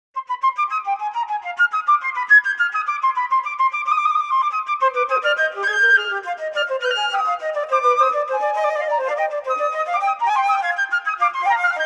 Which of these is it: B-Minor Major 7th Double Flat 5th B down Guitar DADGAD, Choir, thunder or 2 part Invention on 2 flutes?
2 part Invention on 2 flutes